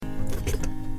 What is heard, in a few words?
slurp